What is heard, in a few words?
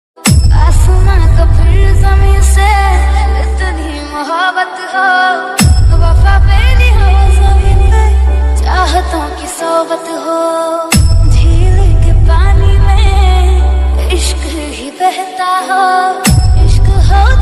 Tik Tok Ringtone Hindi